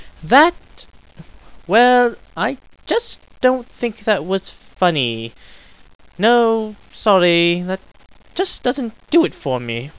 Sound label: ahh, scientist